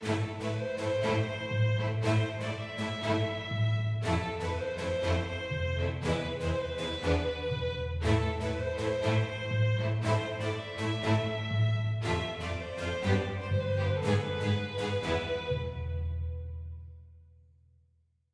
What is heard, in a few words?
heroic med